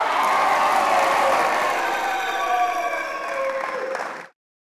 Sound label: Crowd Cheering; Pitch Black Audio Pong